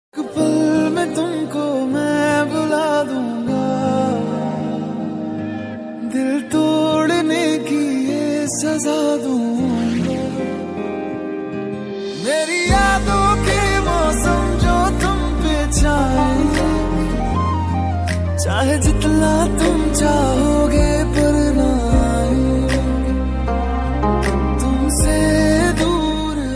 Breakup Song Ringtone

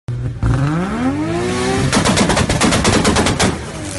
Car Notification Ringtone; Supra

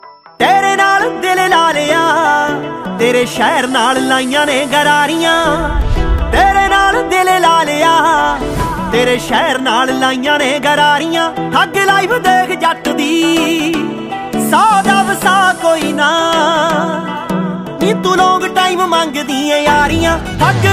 Punjabi Song Ringtone

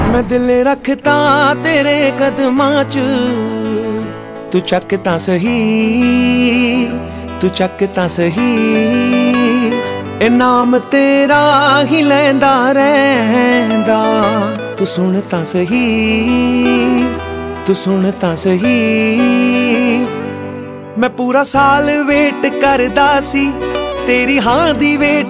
Valentines Special Punjabi Ringtone

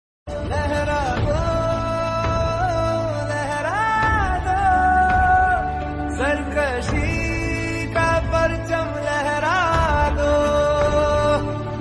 Independence Song Ringtone

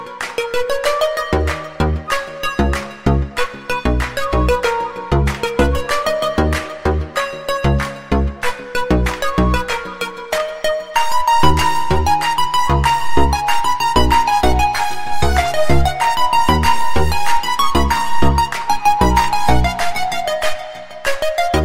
Viral Song Instrumental Ringtone

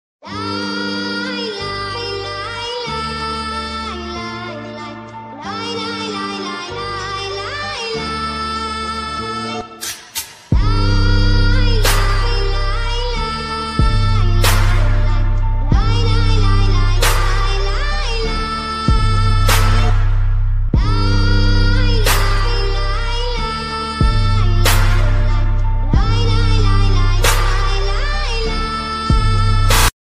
Mood Off Ringtone; ringtones

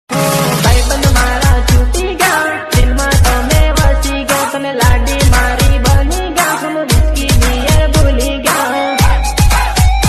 Gujarati Ringtone

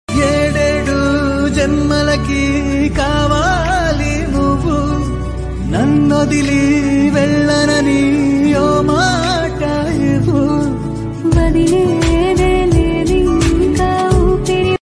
Telugu Ringtone

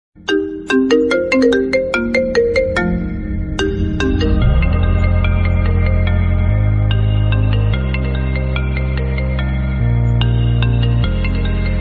Iphone 13 Pro, Mobile Sound Ringtone

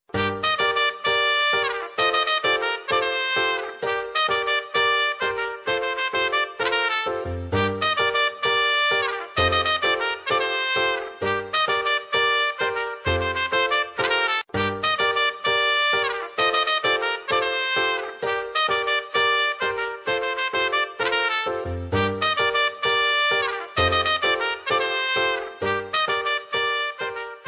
South Indian Background Music Ringtone; ringtones

